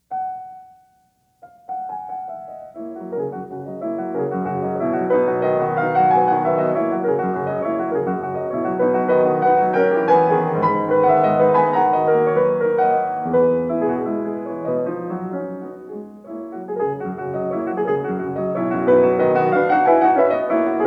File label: Preludes; Chopin